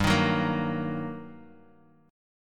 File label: G Minor Major 7th Sharp 5th, down; GmM7#5 strummed; JGuitar